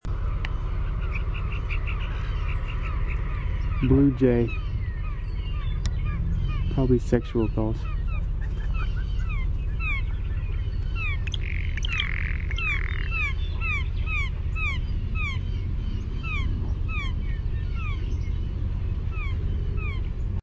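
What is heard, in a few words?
Blue Jay contact rattle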